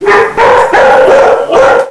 Dog bark; Motorola MPx220

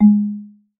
Marimba

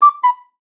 Cartoon Cuckoo; China Telecom

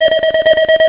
Pulse, Cisco Unified IP Phone 7945G

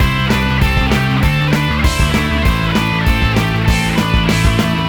neopunk, ringtones; Google; NewWave Labs

